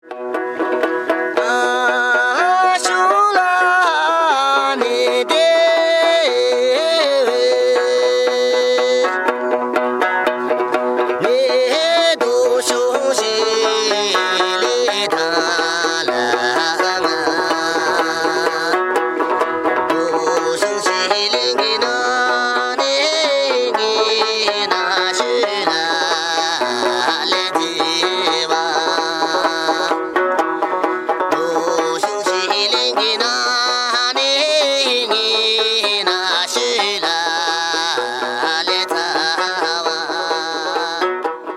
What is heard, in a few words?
Tibet Lute